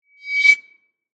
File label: Whoosh Ring; Whooshes (Sound Effects:Sound Design); BlastWave